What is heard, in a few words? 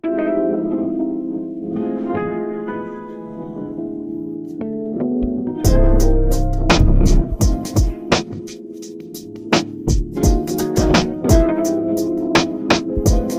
chillhop lofi vinyl crackle soft beats jazzy guitar relaxed cozy study music urban evening; calm; AI audio; Stable Audio; Stability AI